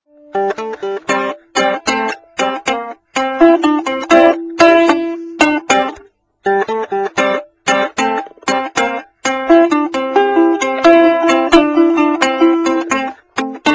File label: Blues Bari